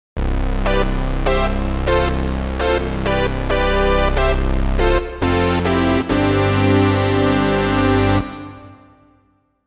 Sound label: jump plate; opxpro3